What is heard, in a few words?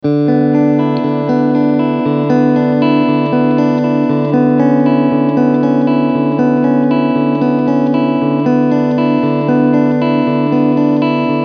Clean Front HB und SC